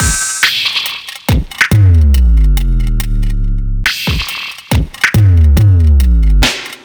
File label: Atmos Break, Bpm 140, Dubstep, Drum Loops